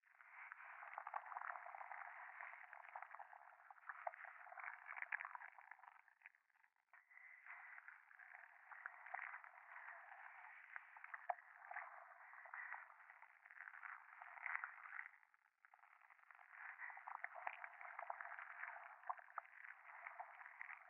左耳 密着 吐息 触手

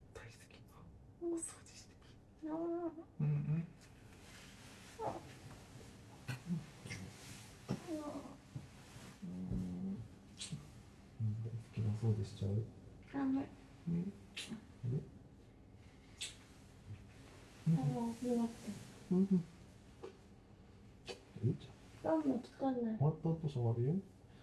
風呂前クンニ; Audio Denoise

